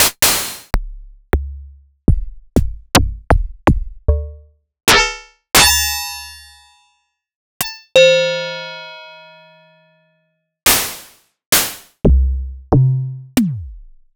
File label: Yamaha TX7 TX81Z Drums